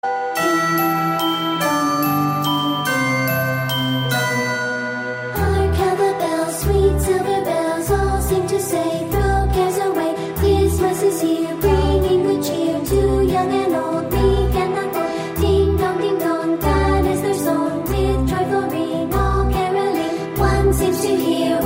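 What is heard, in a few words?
christmas carol; holiday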